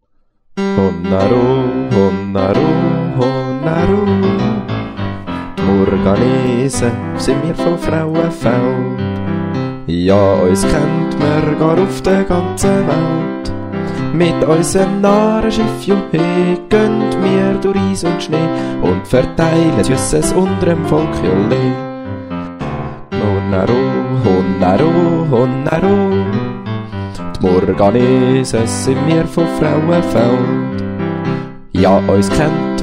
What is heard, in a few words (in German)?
Murganeselied Gesungen